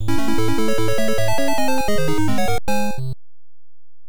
Full Power-Up Intro; Star Soldier; nes

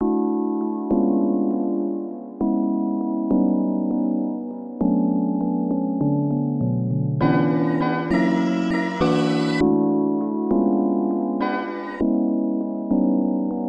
Instrumental

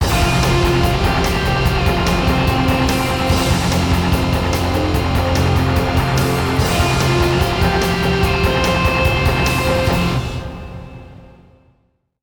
Combat; sid meier s civilization revolution 2 2015 vita

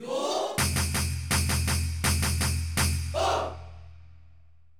Jingles; Fuurai no Shiren Gaiden Jokenji Asuka Kenzan; Dreamcast

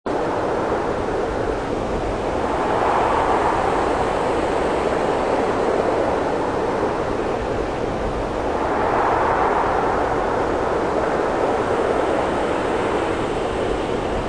swamp wind

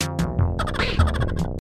Clockwork Machines - Cartoon

